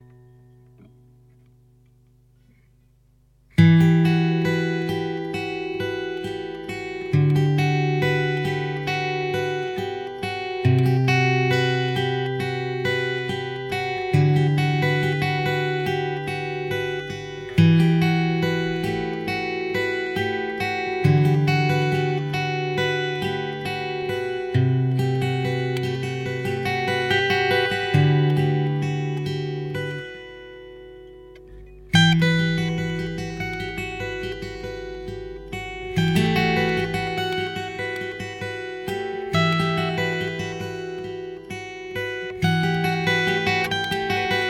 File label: yamaha fg 720s, guitar